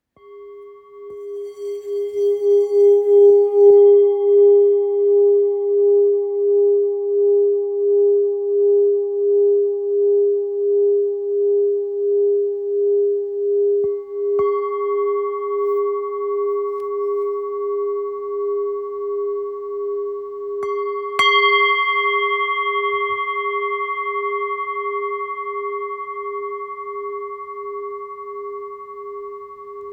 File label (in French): Bol ancien sol